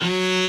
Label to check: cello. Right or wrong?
Right